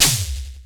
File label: PTX PER; Crypton - DrumBase5000